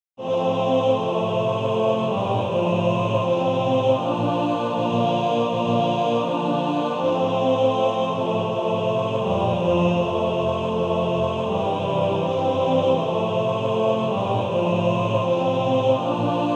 Male Choir; He Leadeth Me

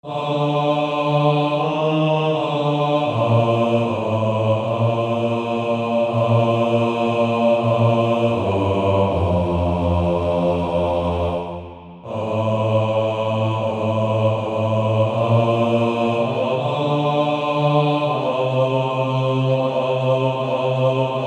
Male Choir

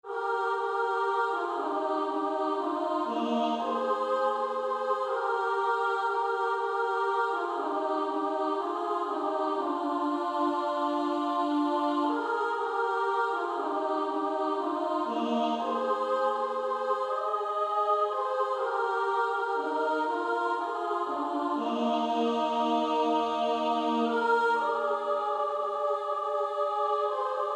Female Choir SA; He Hideth My Soul

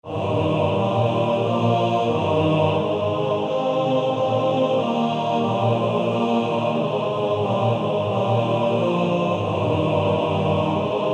Male Choir TTBB

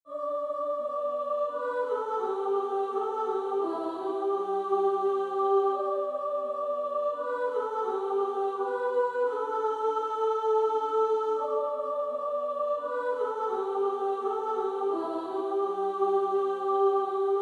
Female Choir

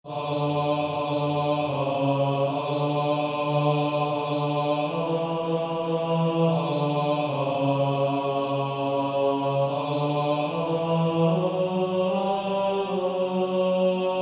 Male Choir; Baritone; Coventry Carol

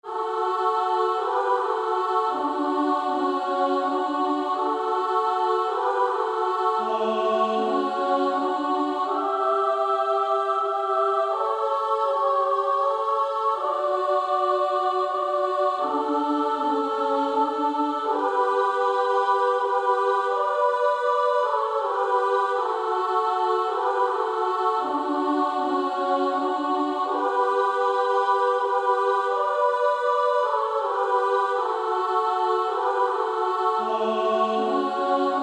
Female Choir SA, 2-part; Silent Night

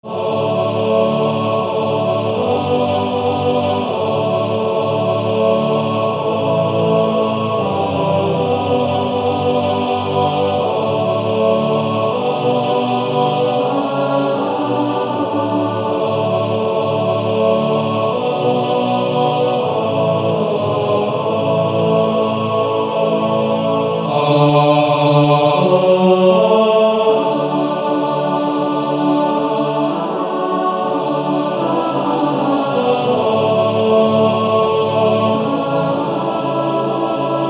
Male Choir TTBB; Low In The Grave He Lay

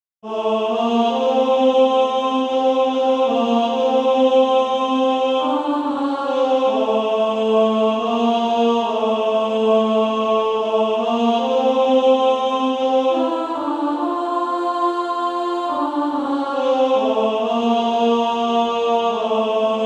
Female Choir A1; No Night There

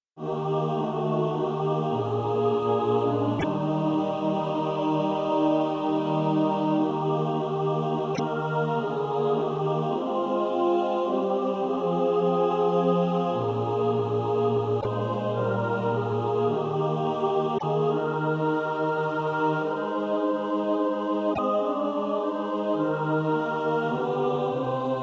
mixed

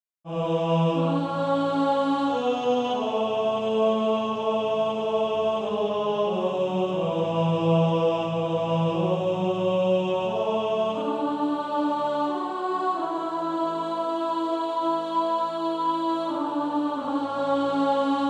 Male Choir; 1st Tenor; TTBB

